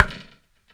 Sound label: Thunder Drum